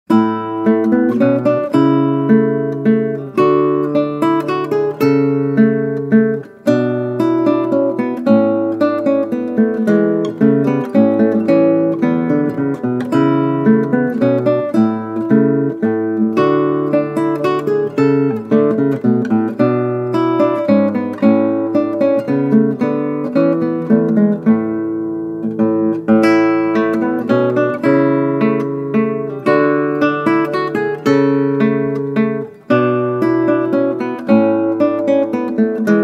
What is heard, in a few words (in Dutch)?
Klassieke Gitaar; Bach 50 Solos